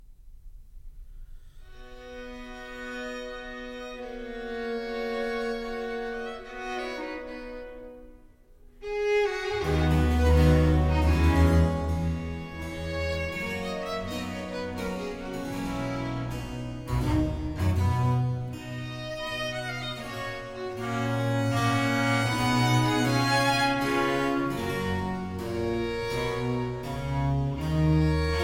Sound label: concerto for cello strings and basso continuo in a minor andante